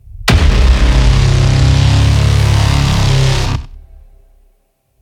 Hit; Monitor

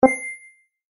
Tap Interface Button

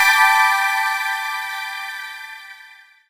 SFX; Wario Land: Shake It!; Kirakira 2

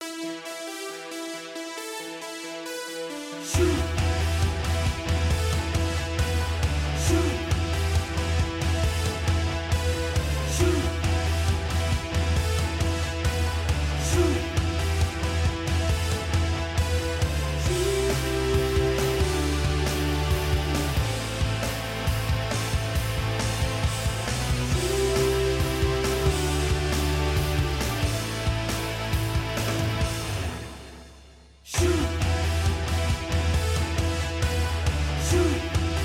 Karaoke Rock; The Sims 4 City Living; macOS; PS4; Windows; Xbox One; Xbox Series X/S

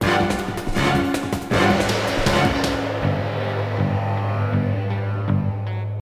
Action END; bolt 2008 pc gamerip

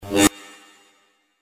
Sharp Raw Whizz

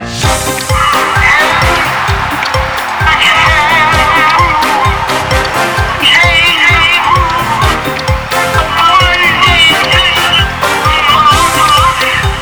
Grunt Win Full Music

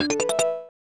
Heart Collect; The Mask; SNES

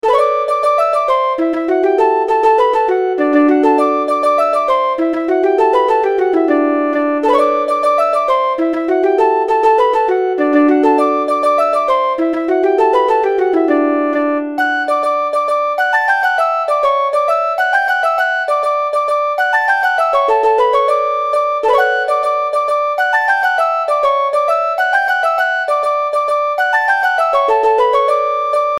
Rural; Ahriman's Prophecy; RPG Maker

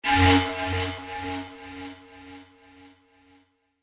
panflute

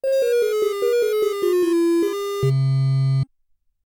Round Clear Music; Phozon; psx

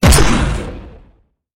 laser impact

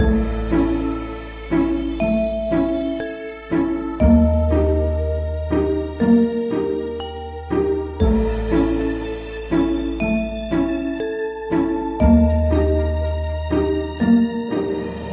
Space Theme Loop; Doodle Jump Space Chase; Mu Ship

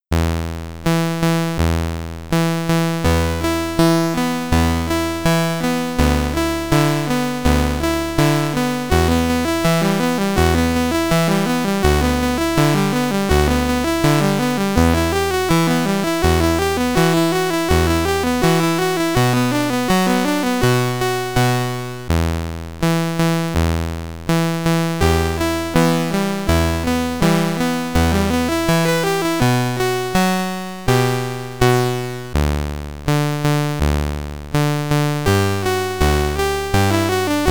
Main Theme, SID Stereo; Spelunker; Commodore 64

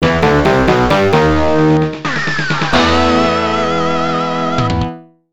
Game Over; Vapor Trail: Hyper Offence Formation; arcade

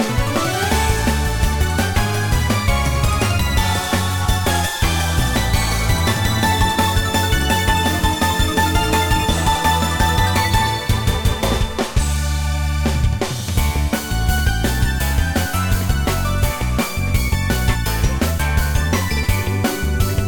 Bgm Menu; miracle girls festival ps vita gamerip 2015

